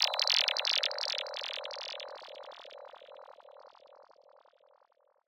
Interface Bleep; MatiasMacSD; PRESS - INTERFACE SOUNDS

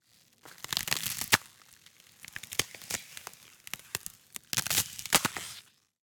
ICE Thin Cracked Crust on Lake; Articulated Sounds

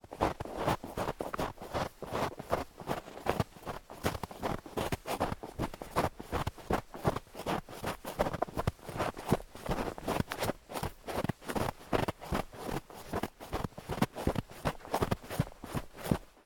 footstep multiple snow boot run; Foley Elements Ice and Snow

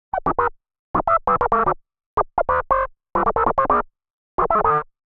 Android Talking Wah Goofy, ARP 2600; Bolt; ARP 2600- Droids, Blips, Drones & more

